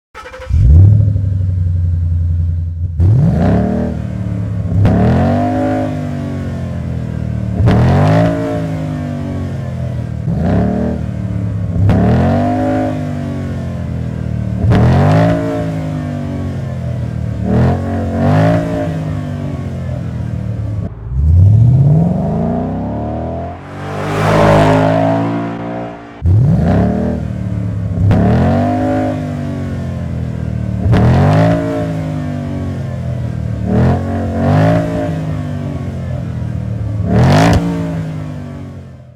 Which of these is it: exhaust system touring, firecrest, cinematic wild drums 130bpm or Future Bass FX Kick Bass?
exhaust system touring